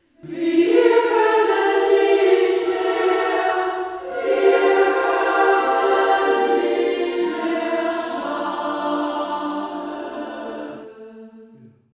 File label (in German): Auftakt Takt 35 ff S 3 im Tutti